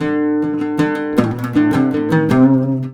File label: LUTH E, Arabian Traditions, LUTH RIFF; USB Soundscan vol.43; AKAI